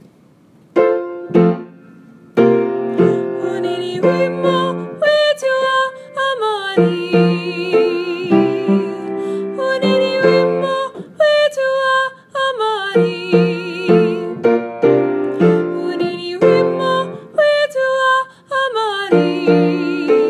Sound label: solo with vocals